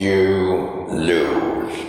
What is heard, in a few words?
You Loose